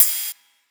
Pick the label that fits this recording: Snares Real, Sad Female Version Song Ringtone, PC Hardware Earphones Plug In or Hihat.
Hihat